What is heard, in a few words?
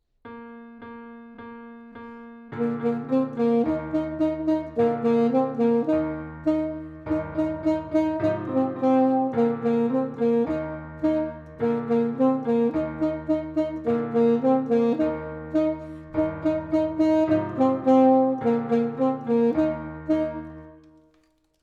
Suzuki Daddy Long Legs Alto sax